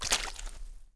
Foot Mud; Civ4 beyond sword

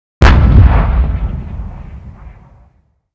Vehicle Weapons; Red Orchestra Ostfront 41-45

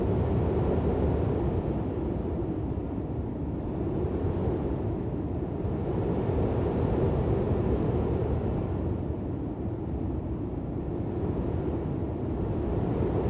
ambient battle; World Of Warships

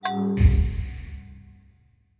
Negative; Civ4 warlords

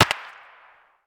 body distant; Escape from Tarkov

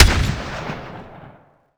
imperial carbine 1shot

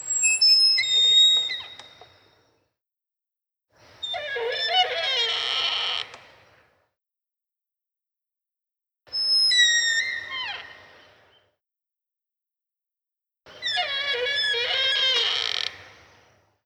shutters swing

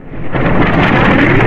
Artillery; Red Orchestra Ostfront 41-45

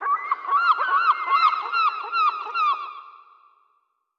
ambient port; World Of Warships; port3d

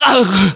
death, voiceovers; english

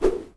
Swing Cable; Civ4 warlords

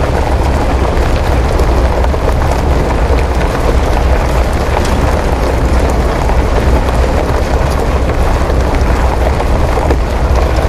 Old Plane Move; DCS

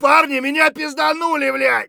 bear3 hurt heavy; Escape from Tarkov

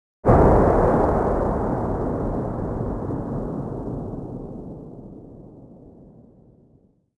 expl far tail, explosion; World of Tanks Blitz

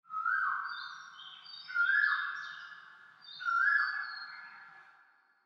tropics birds; ambient; War Thunder

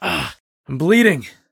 bleed in; Escape from Tarkov

